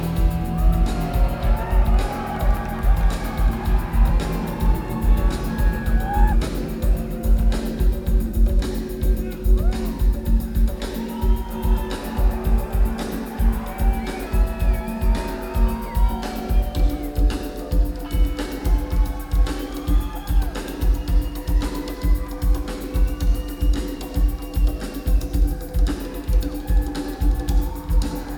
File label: Drums; 1996-06-16 The American Theater, St. Louis, MO